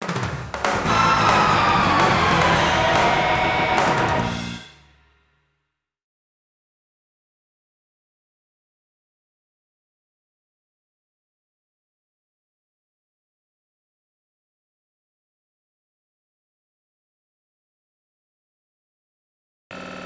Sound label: you lose; double dragon neo geo cd